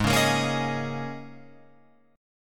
G sharp Suspended 2nd Suspended 4th, G sharp, down, Guitar, Open F; strummed